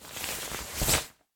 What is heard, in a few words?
cloth Remove; spellbound